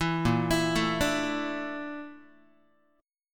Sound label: A sharp Major 7th Flat 5th A sharp Guitar; A#M7b5; arpeggiated; JGuitar